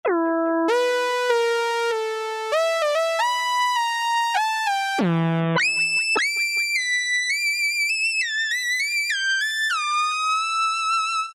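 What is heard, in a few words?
squeaky lead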